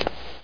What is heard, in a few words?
Steps